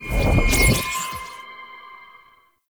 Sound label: Mirror Portal Beacon Stinger; Warframe; Duviri Murmur